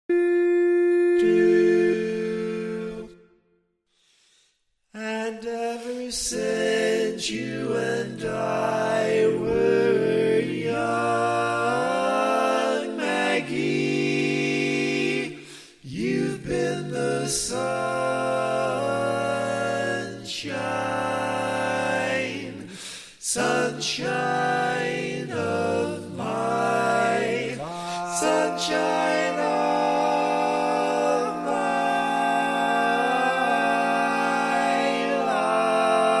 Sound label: Maggie, Bari, barbershop tags